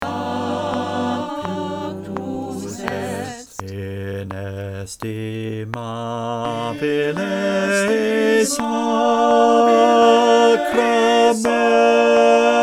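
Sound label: bass click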